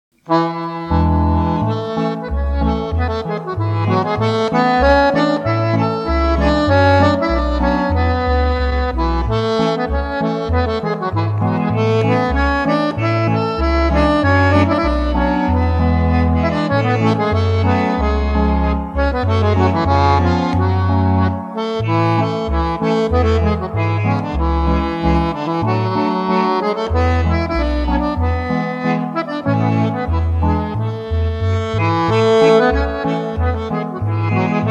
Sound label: Simple Folk